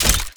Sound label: chngun